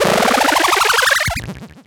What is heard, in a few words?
LFO, Noise